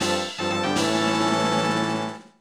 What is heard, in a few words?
Catch Small Item; Legend of Zelda, The : Majora's Mask